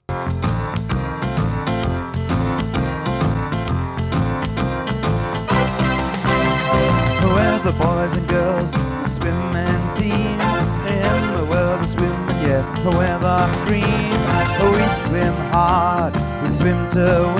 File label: splash splash; the olympics musical paris 2024; musical productions